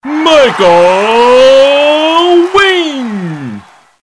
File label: win; mage